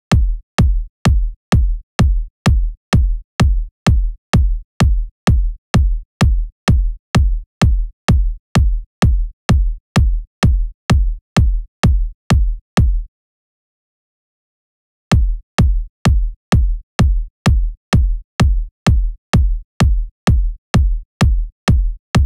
128 BPM Kick; Vengeance Essential Clubsounds Vol.5; VEC5 Demo Constr Kits; Slide Kit; Root G; Drums & Perc

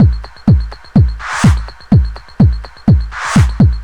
flywheel, Deep House 125bpm

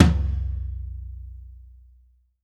Tom, Live Kit; Sonic Foundry (Sony Creative Software); Ugly Remnants VOL-2; Single Shots; Beatstuff

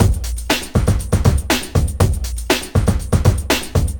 housephunk, beats; Sonic Foundry (Sony Creative Software); Ugly Remnants VOL-2

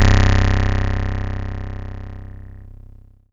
C1, Synth Line; NiceBeats

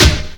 hit, PERC; Techno Trance Essentials

